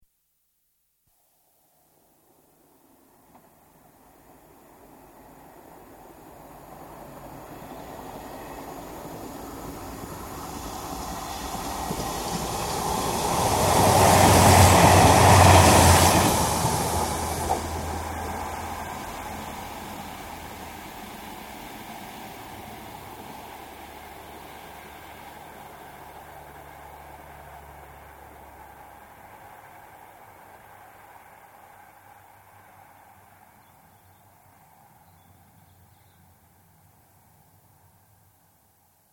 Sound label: Diesel commuter train passes under bridge left to right; BBC